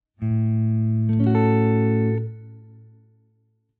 A maj6, 5th position, A Major 6th (no 5th) chord, guitar; Here is the sound of the A Major 6th (no 5th) chord.; triad substitution